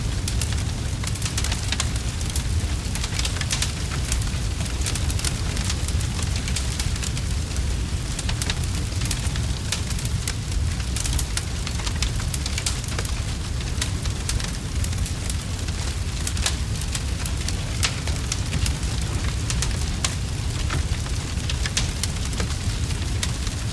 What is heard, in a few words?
Fire loop; The Joy of Creation Halloween